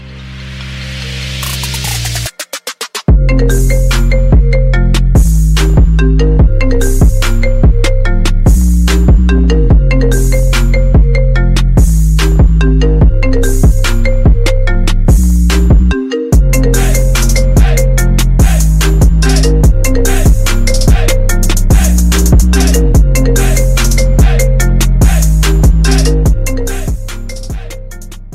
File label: Ringtone